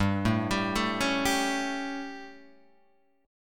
G Suspended 2nd Flat 5th G Guitar Open G

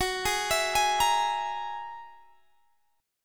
G sharp 7th Suspended 2nd Sharp 5th

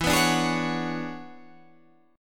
E 13th, Guitar, Standard; strummed; JGuitar

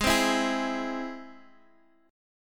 Ab Augmented Ab Guitar DADGAD; x,11,10,9,11,x

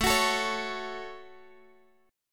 A 6th, Guitar, Drop D; 19,21,19,18,x,x

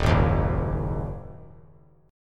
D sharp Minor Double Flat 5th; strummed; JGuitar

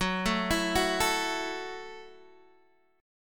Gb Minor 7th Gb, Guitar Open D

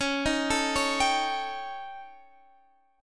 D sharp 7th Flat 5th C sharp

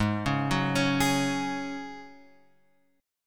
Ab 7th Suspended 4th Ab Guitar Open F; Ab7sus4 arpeggiated

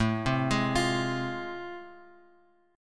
A Minor Major 7th Sharp 5th; AmM7#5 Chord {5 3 6 X 6 X} arpeggiated

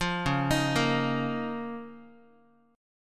Db 6th Add 9th Db down Guitar; Db6add9 arpeggiated; JGuitar

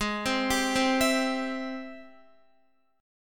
Ab Augmented Major 7th Ab Guitar; arpeggiated; x,11,12,12,12,12; Ab+M7; JGuitar

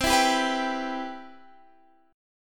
G 6th C

